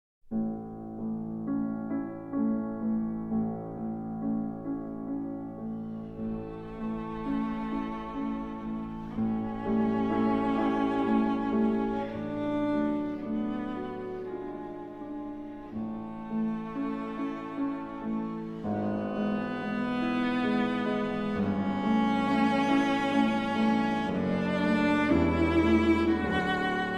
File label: Adagio, Cello and Piano; Octet in F Major, Op. 166, D. 803 II.